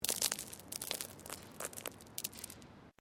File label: Stone Small Move